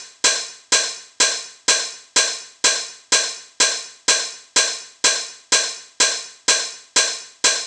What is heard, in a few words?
drum niner hat 125, Drum Loops, Classic Chicago House